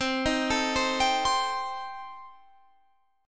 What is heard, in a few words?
C Minor 6th, chord